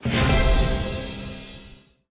pack open epic; Animation Throwdown; cardbabies

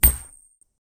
Coin flip

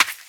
footstep dirt; Scrat

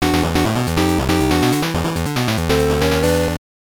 Jingle, soundtrack; Gamera Daikaijuu Kuuchuu Kessen; SGB

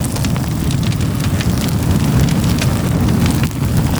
Weapon Loop (Flamethrower)

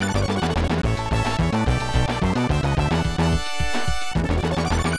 Player Lost

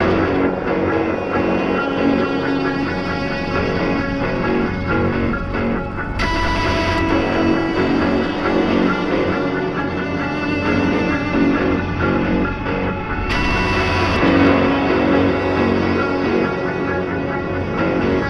GTR SYNTHS; transformers war for cybertron gamerip; RESCUEZETA

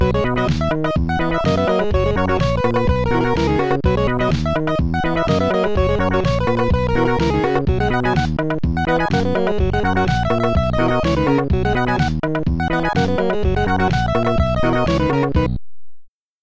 Level Complete; qix amiga

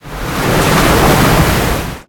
Merfolk

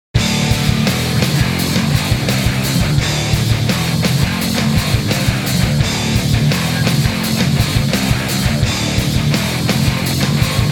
Jukebox Heavy hard racing

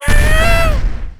CAT DIES; Spacecats with Lasers

